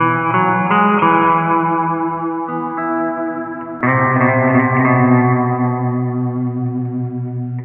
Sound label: guitar, stringed instruments